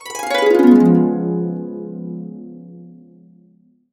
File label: DB7, Harps; USB Soundscan vol.62 - Complete Harps; AKAI